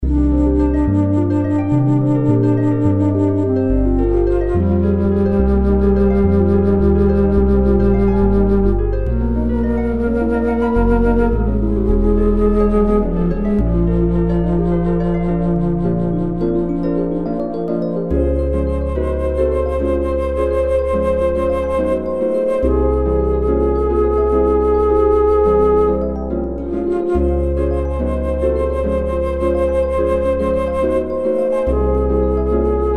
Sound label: Trumpet Song